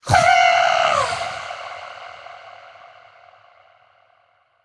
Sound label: cat; Dark Village